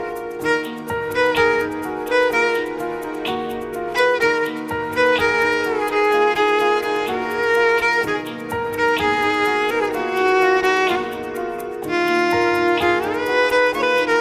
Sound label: violin ringtone